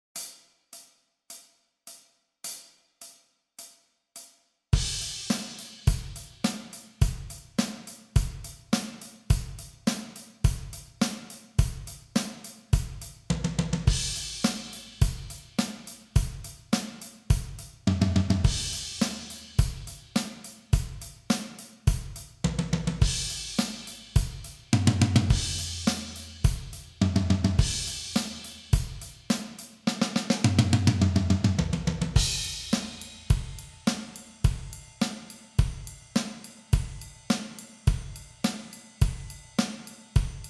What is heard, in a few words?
105 bpm, practice tracks